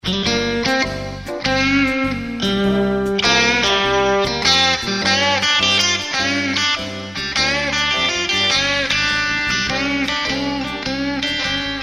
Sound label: Bridge Marsh Stalone solo, vp strat56